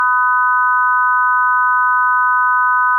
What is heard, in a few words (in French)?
accord 1kHz